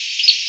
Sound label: song